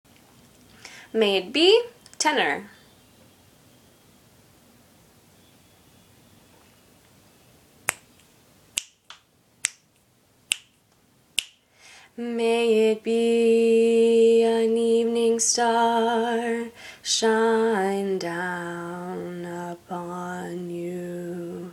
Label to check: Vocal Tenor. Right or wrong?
Right